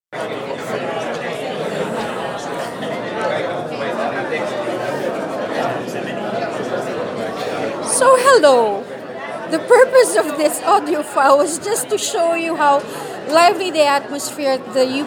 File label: buzz